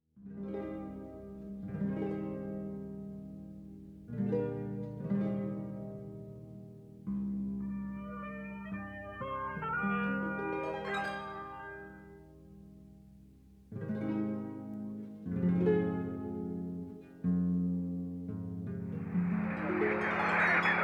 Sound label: jingle